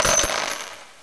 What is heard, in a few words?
coins; RRT 1.01